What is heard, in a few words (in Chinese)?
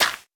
可疑的沙砾放置声